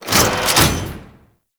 Fortress Traps Turret Door Open; Ambience; Warframe; Grineer Fortress

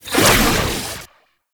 Archon Whip Whoosh; Warframe; The New War; Nira

